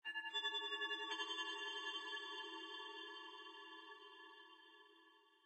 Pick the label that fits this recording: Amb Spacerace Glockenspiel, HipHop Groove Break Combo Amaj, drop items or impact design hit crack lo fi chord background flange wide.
Amb Spacerace Glockenspiel